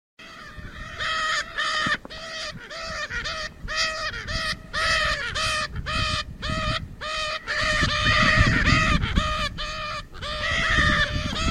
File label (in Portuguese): canto da arara azul de lear